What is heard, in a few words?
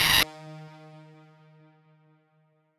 Slot Machine Symbol Show Up